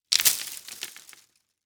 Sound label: Impact Tree Branch Fall